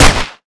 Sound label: USP, weapons